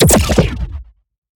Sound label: Shot Small Blaster Pure Fusion